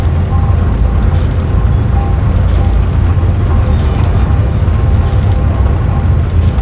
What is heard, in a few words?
Giant Flywheel Loop